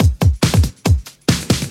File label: Loops BB 140, BreakBeats; Vengeance Samples